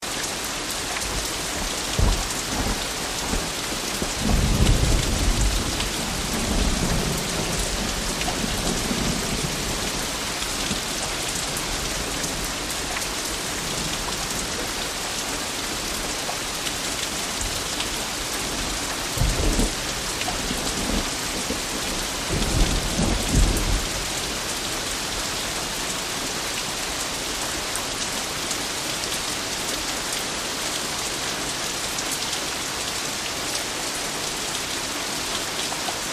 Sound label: Rain; 雨声